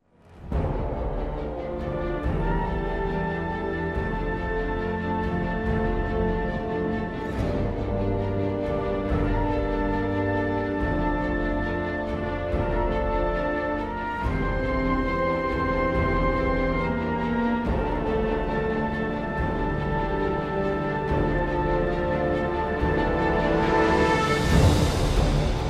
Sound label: Battle